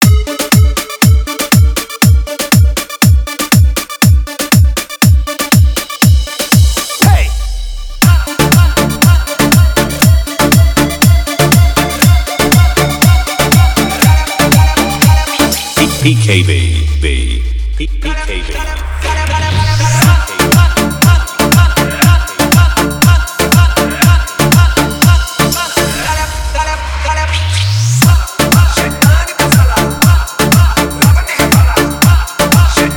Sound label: Electro Remix